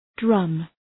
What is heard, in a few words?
drum